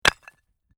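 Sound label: Brick Impact Or Cinder Drop; Stone slab, brick or cynder drop to the ground and settle.; Rock Sound Effects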